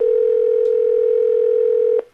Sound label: phone ring